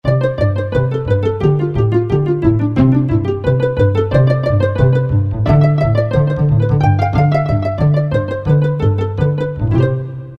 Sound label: Velo Pizz, Korg N364; Factory Combi demos